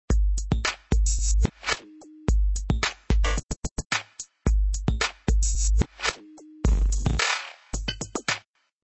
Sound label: BGM Event Electro; Shop Empire